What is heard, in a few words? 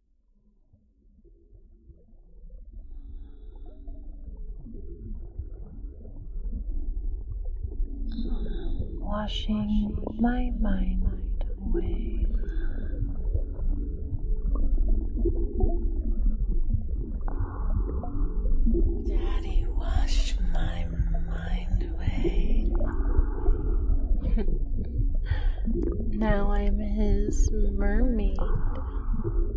Warm Wet Waves